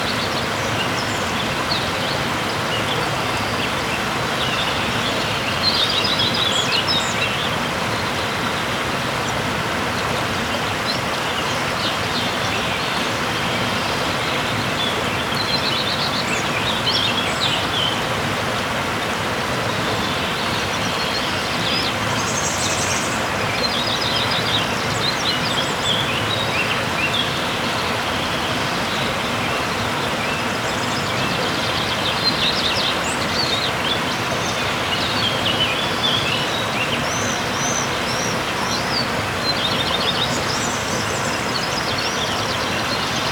Lightning Rain Sounds Forest Birds River Close; Loopable

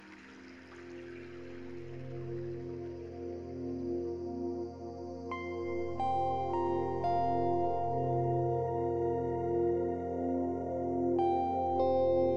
Ocean Sounds - Ambient Peace

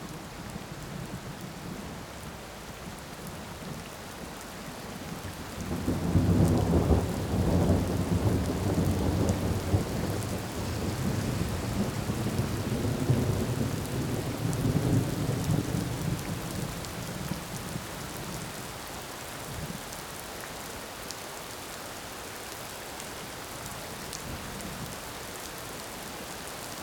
Rain Thunder Evening

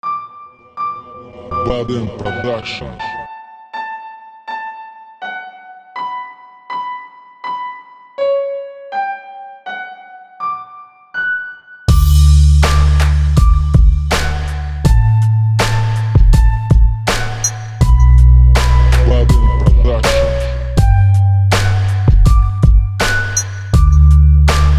Beat

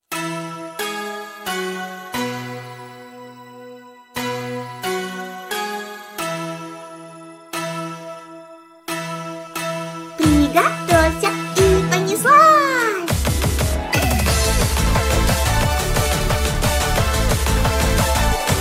Eurobeat Remix VOCAL COVER

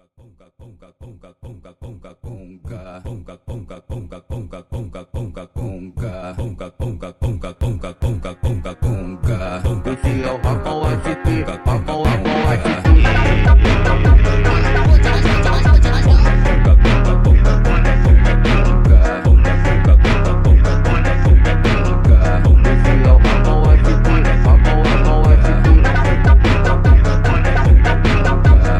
Conga Phonk

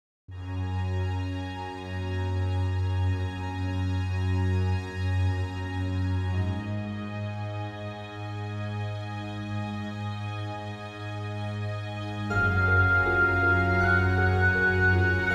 Thunderous Trumpets

